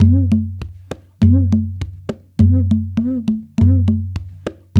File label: Haitian Congo, World Dance